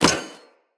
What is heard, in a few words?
Spear Catch; Weapons; Player